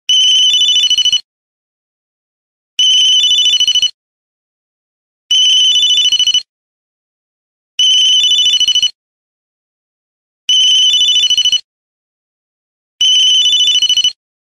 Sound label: Mobile Ringtone